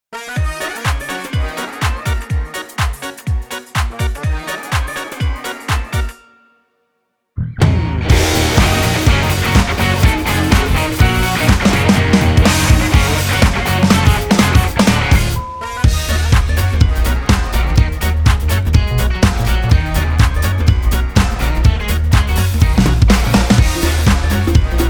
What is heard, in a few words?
Froggy Boogie instrumental